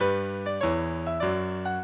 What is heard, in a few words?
minuet, mozart